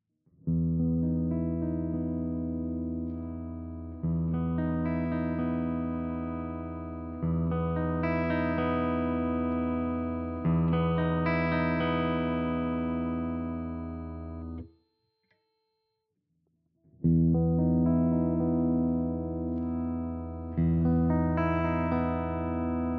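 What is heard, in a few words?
clean Tone, guitar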